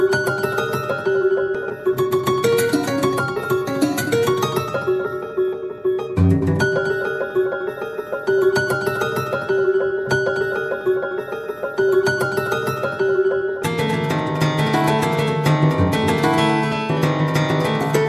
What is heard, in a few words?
piano